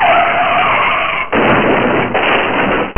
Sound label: car crash